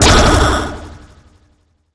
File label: Damage; Pacman Damage; Pac-Man Championship Edition 2 Plus